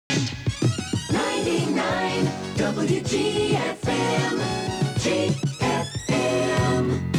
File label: radio jingle; wgfm